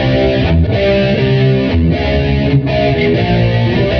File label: guitar, Phonky Loops